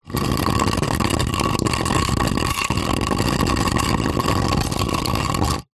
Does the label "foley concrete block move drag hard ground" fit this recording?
Yes